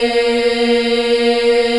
A#2 child eh, Choir; USB Soundscan vol.28

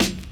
KIT, Dance Mega Drums, HIP; Best Service

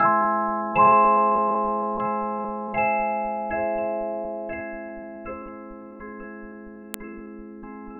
Chyme

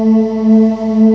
CHOIR, HUMANS; M8; CMI Factory

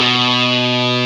GTR FUZZ; Club-50 - Foundations Roland; Dirty; Stack

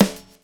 GRV, Groove; AMG Gota Yashiki Groove Activator

